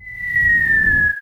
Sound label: airstrike fall